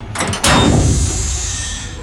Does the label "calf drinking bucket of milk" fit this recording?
No